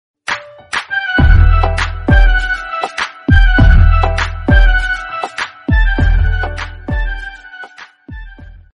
rocking music mobile tone ringtone

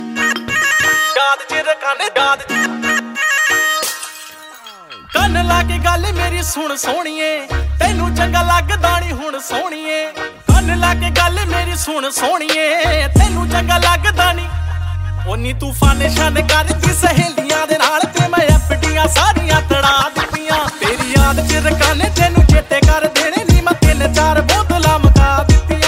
music ringtone bollywood ringtones

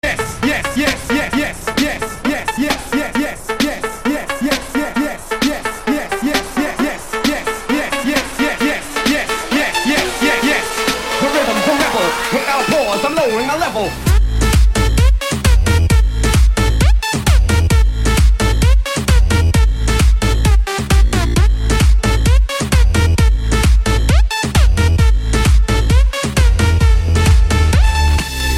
dance remix ringtone

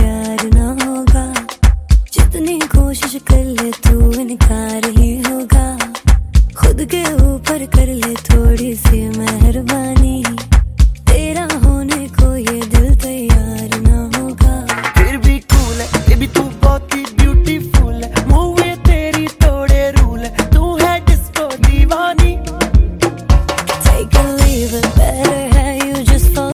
dj mix; bollywood ringtones

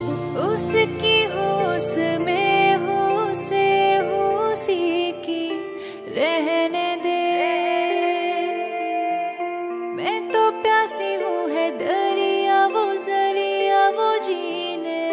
female bollywood ringtone

